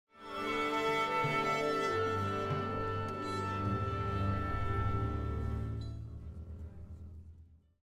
Orchestra Tuning Ambience - Strings Mainly Long Symphonic - Musical; Hollywood Edge; sound designer's toolkit v2